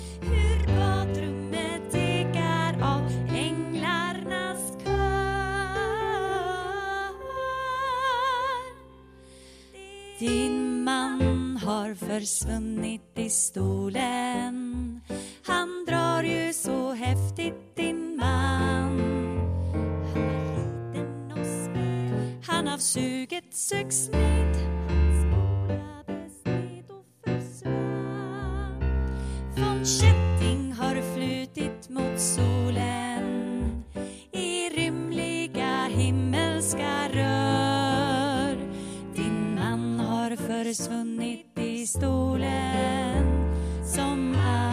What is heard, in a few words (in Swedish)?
Senior alt